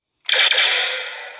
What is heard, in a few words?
blaster ringtone; Boba Fett